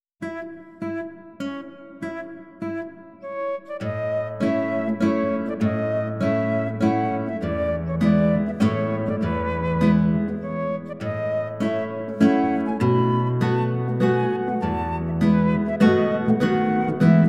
easy and pleasant duets for flute and guitar

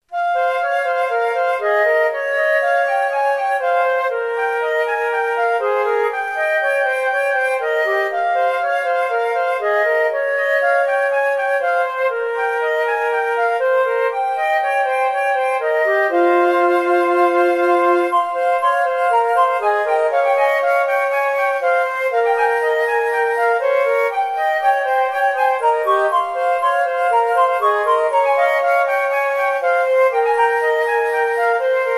flutes; kearns richard; study

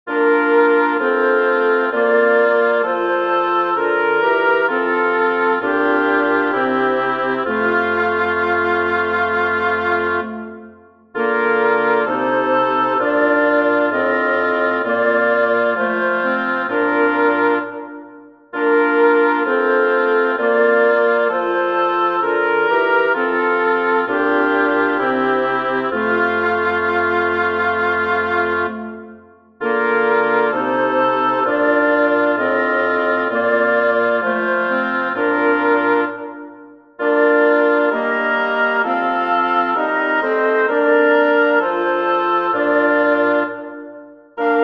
choral for woodwind quartet; bach johann sebastian; ich will daraus studieren

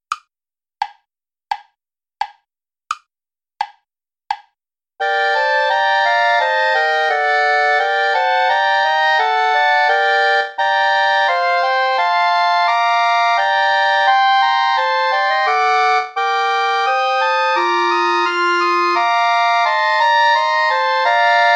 play along without clarinet; bach johann sebastian; beweis dein macht herr jesu christ

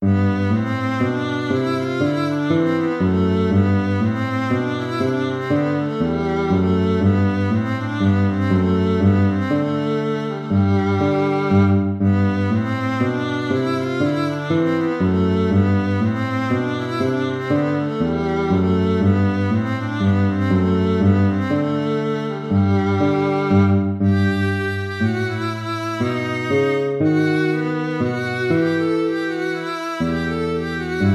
baroque minuet major

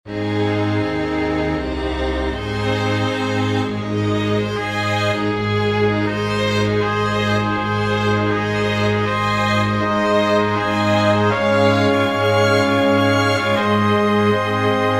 sanctus for brass strings; cherubini luigi; from the requiem minor mvt

